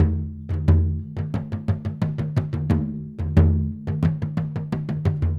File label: Bodhran, Celtic; Sonic Foundry (Sony Creative Software); Continental Drift Aftershocks